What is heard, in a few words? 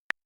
typing sound